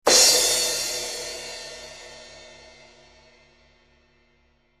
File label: 16in Custom Medium Crash, cymbal